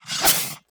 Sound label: lycanthrope strike